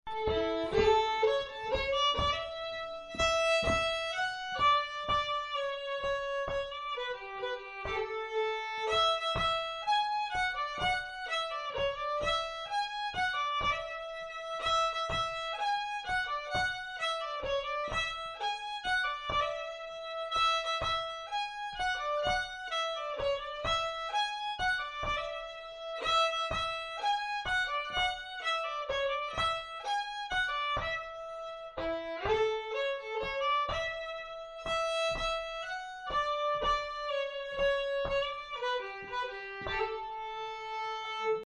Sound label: Vals; Vals fr Alvdalen